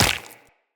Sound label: Run Flesh, Footsteps; Characters; Tenno